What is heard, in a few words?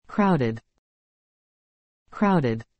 crowded; English